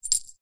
bell very small single ring

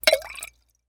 food drink liquid spirit liquor pour one shot; martinimeniscus

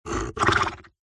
cartoon horse snort; zapsplat

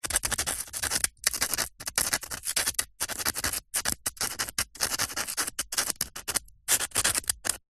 office brick pen ball point trying to write on

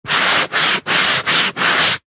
household sponge large wipes rubs cleaning slow; zapsplat

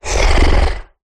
animals horse snort human; zapsplat

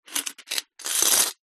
foley money coins in metal money box movement; zapsplat

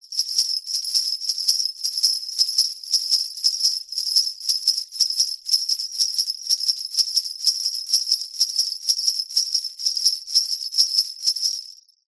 musical sleigh bells shake rhythmic

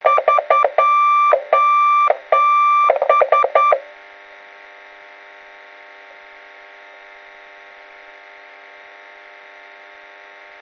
sos morse radio message loop

